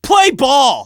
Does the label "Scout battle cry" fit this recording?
Yes